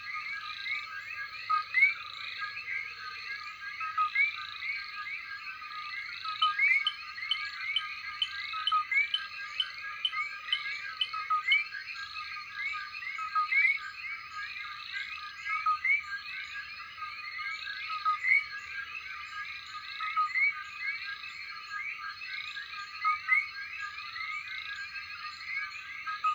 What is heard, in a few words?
coqui frog night chorus; wilderness songs